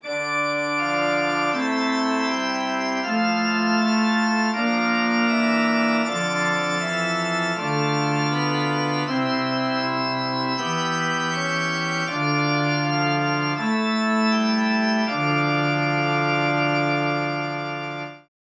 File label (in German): Zwei simmiger Contrapunkt; Ronge